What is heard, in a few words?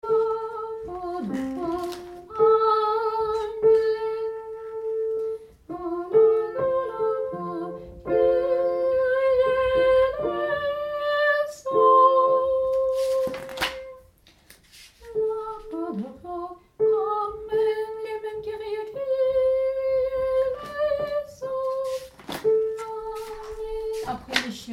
Kyrie Tenor